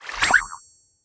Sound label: flower blooms; activity center; backyard; preschool time